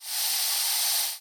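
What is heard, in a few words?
snake threatening hissing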